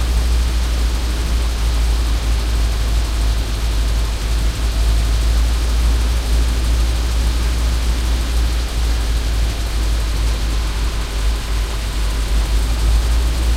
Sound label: sherman m4a1 engine int rpm 00, tanks; engines; War Thunder